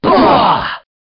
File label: boaah; ringtones